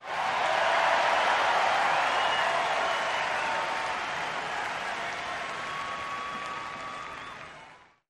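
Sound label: Crowd 50000 Whistle, large int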